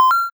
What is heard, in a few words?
countdown green click; system